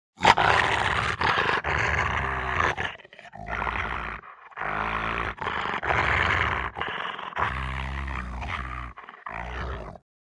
Dog, Rottweiler Growls. Lengthy Breath-like Growls. Close Perspective.